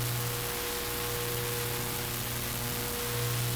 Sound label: Shock Loop; Barotraumatic